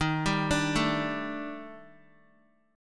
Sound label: Eb Major 7th Flat 5th Eb Guitar 4F 4A 5C 5G 5C 6E; EbM7b5; arpeggiated; JGuitar